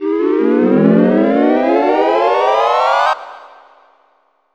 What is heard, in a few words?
warp; Banjo Kazooie